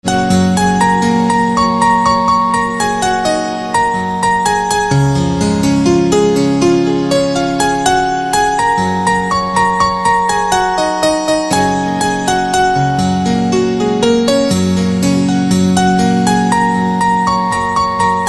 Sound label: PIANO Ringtone